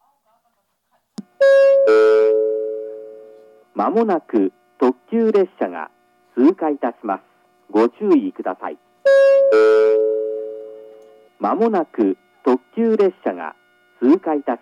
pass limited express; fujimi; jr-east

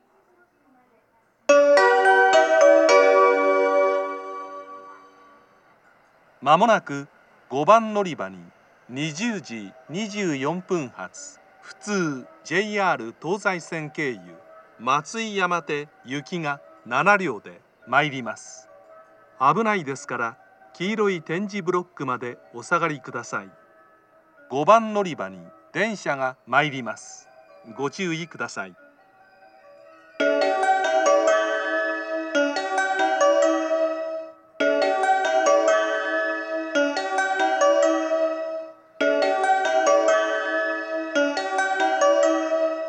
amagasaki local matsuiyamate; station; jr-west

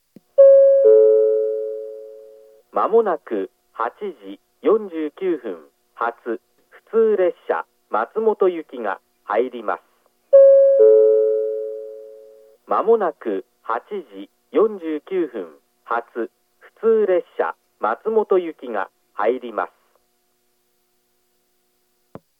up local; matsumoto; jr-east

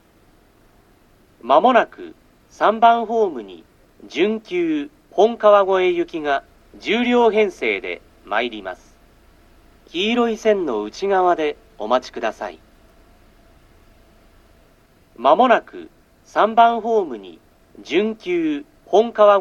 takadanobaba semi express; hon-kawagoe; seibu

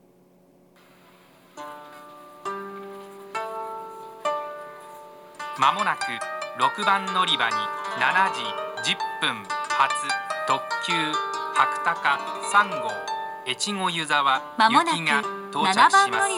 kanazawa limited express; echigo-yuzawa; hakutaka3

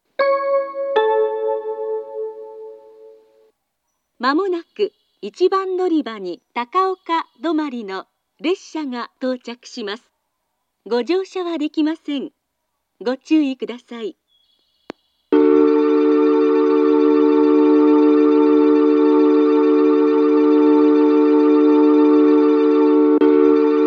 takaoka stop, station

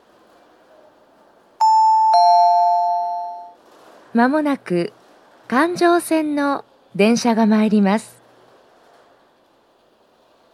loop line; toyama eki; chitetsu

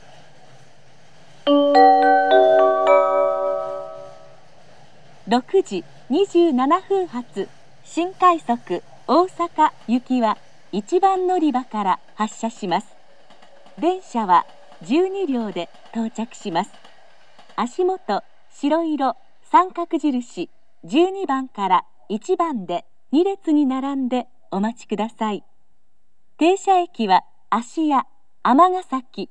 sannomiya special rapid service

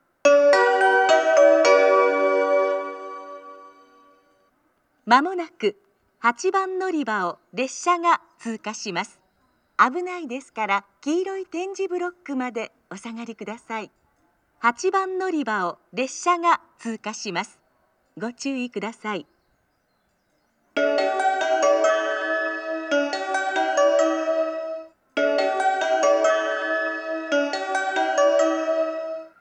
amagasaki pass, station; jr-west

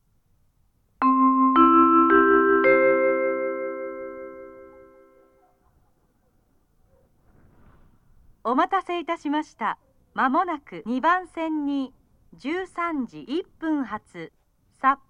rapid airport, station; eniwa; sapporo; jr-hokkaido

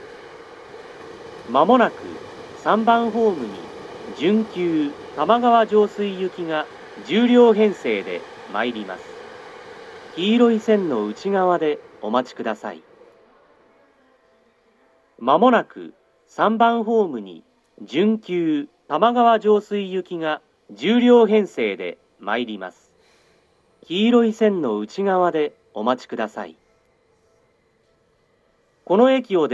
takadanobaba semi express; tamagawa-josui; next saginomiya; station

